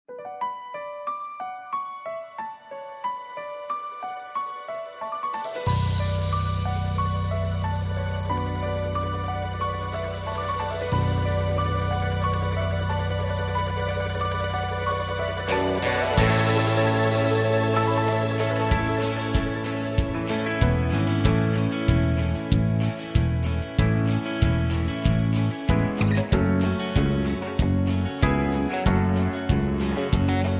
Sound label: (Bm) No Backing Vocals